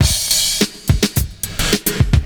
70s Breakbeats; USB Soundscan vol.46; AKAI

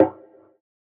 Fx Vox; Equipped Music; Loungin House

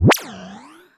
Zip Boing, cartoon; Classic SFX; musicradar; cartoon caper

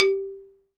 XYLOPHONE A; Synclavier; World & Orchestral; ILIO

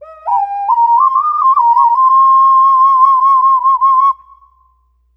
Double Occarina

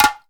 EMELAKO HITS; Best Service; World Colours

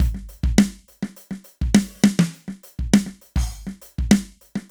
Wooden Kit, V Drum; Sonic Foundry (Sony Creative Software); Electronic Point Blank; Drums & Percussion

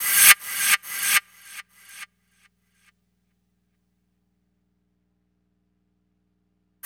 NRG Percussion, Trance

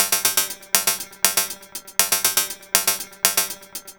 120 Hats, Drum Loops; Terradome; 120bpm; Nu Dance Electro

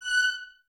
Violins Detache F#6; Miroslav Vitous; 11 Violins

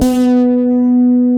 stick, Chapman Stick; Analog Odyssey

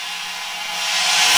REV China, Roland JV-2080

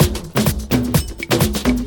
Latin Loop 128 BPM

